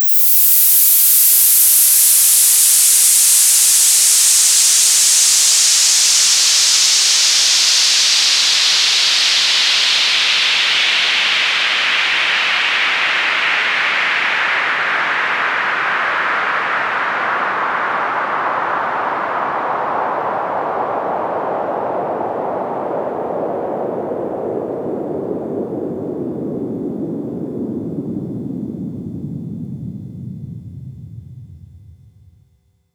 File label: Sweeps Down